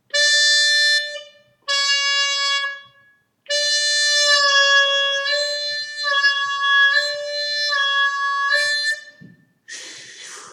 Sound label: 4 draw bending C harpmonica; Seydel